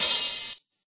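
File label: FM open hi-hat; classic hip hop; STYLE KITS; Classic Hip Hop Mega Kit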